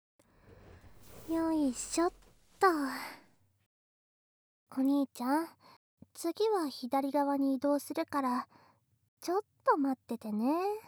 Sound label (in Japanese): おまけトラック・耳舐め左耳